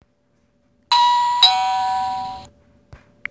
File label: Doorbell